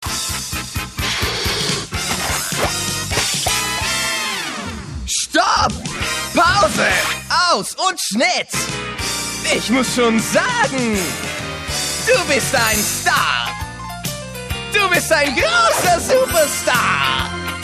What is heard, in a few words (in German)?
Musik Comedy